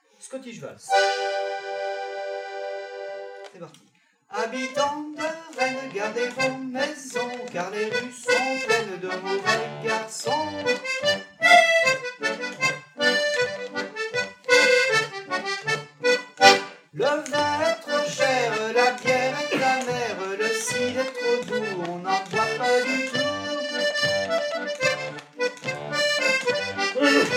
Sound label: scottish valse